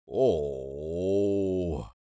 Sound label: Disappointed male reaction